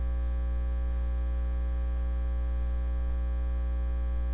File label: cricket